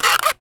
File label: Foley